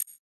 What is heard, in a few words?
tic